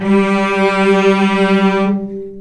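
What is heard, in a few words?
cellos attack G3 R, String; East West; The Ultimate String Collection; VCS ARCO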